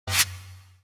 Log Close

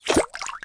Correct; lego my world first steps

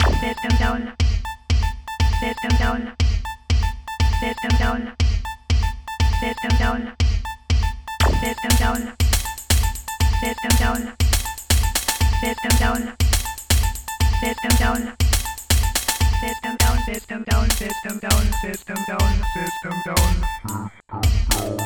Continue

